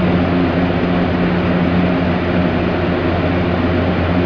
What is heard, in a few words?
fan; laundry